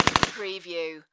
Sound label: Machine Gun UZI Model A Short Burst